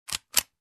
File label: Gun reload